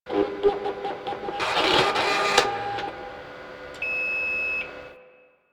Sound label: Office Fax Machine Misc; Warframe; Ambience; NineteenNinetyNine